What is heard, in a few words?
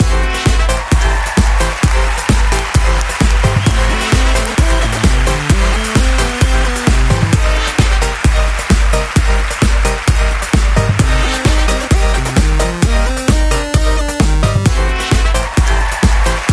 play to win